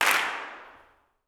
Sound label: CLAPS; Vocal Planet Vol1 Gospel; CLAPS+SNAP